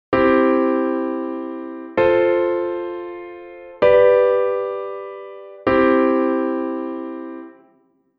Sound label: major chord sound